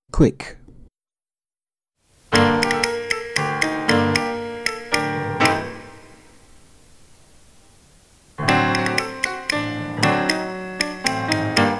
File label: Quick, tap